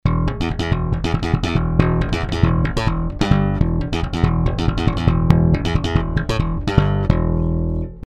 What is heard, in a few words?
bass; UFX